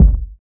clean bass drum one shot g sharp key, kick single shot tuned to the G# note